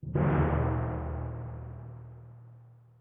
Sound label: Tymp